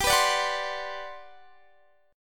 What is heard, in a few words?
G Major 11th